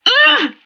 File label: vox frustrated